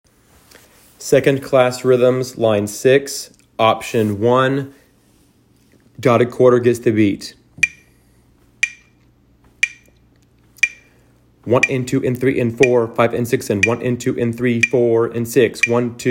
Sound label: rhythms dotted quarter